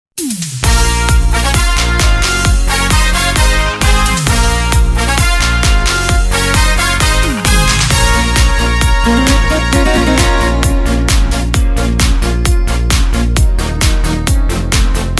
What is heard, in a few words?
Korg Pa3X